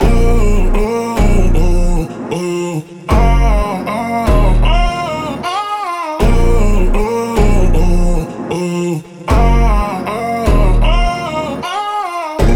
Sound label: 155 BPM A# Min, Melodies